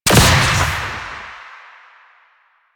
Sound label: Choir Erupt Proj Explosion; Warframe